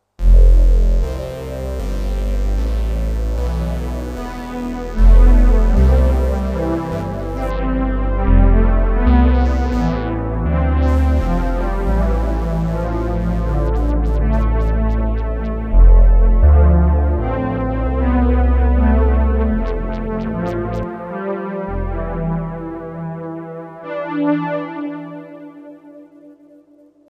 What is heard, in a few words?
jx brass string